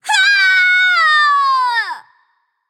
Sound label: fear, voicepack; smite; pele